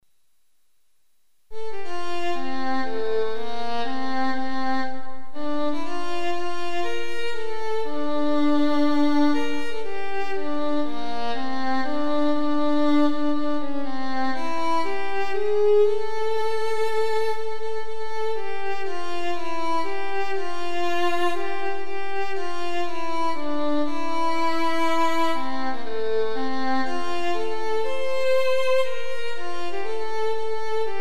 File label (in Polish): hymn melodia